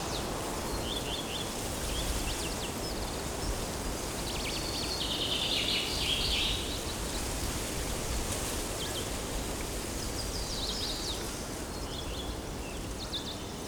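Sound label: ambience appie foothills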